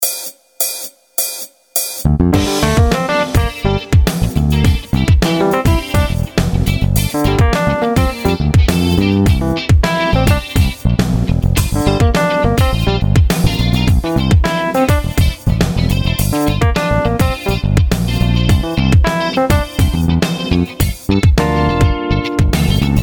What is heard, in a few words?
G minor funk; Frameworks Volume 2